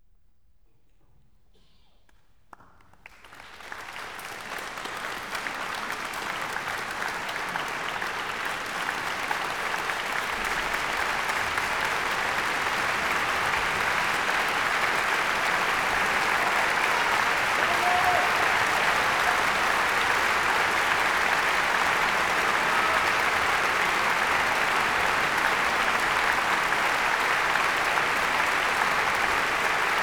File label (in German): Applaus; Peter Tchaikovsky; Symphony No 6 Op 74 Pathetique